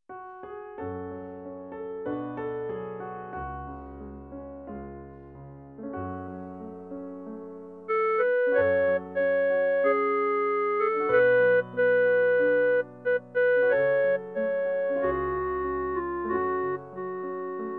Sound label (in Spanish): CLARINETE